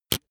razor fold out hard; maschinen; rasierer

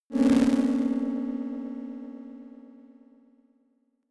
notification crackling mystery; signale

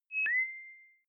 notification message pipe high; kommunikation; signale

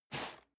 cardboard box medium slide short hard; objekte

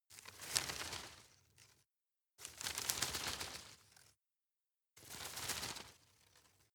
grab shake medium; berufe; foerster

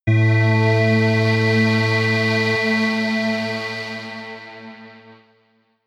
synth sway, drones

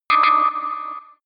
danger double

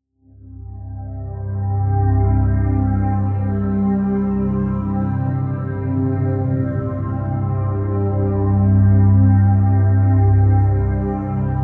pad sweep underwater; flaechen

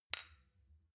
shot glass clink medium, gastronomie; berufe; soundpool

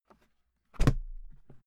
briefcase close hard; industrie wirtschaft; bueroarbeit

